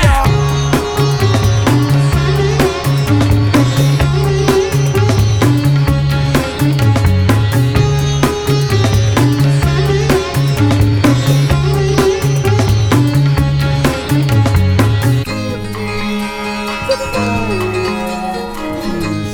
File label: dancing Percussion